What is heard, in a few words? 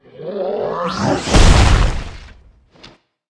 orge attack; monster